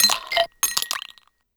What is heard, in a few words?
Ice Cubes; HapticSamples; SoundFX; Feel; NiceVibrations